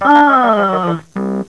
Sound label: die; guant